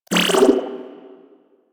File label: Riot Moa Mine Impact; Warframe; Attacks